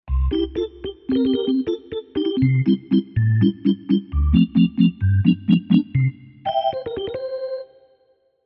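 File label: Glasswish, synth organ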